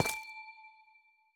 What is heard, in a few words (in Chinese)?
紫水晶踩踏声